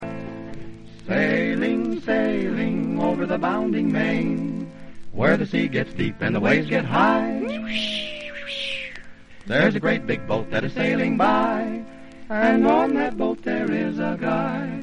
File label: choral